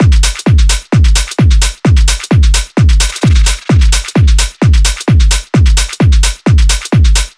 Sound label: Drum Loop 130, Techno